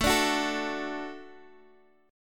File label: A Suspended 6th A